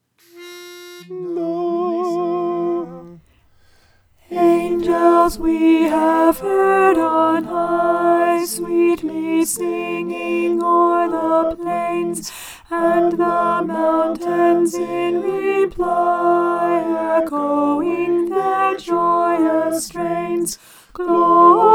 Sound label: angels we have heard alto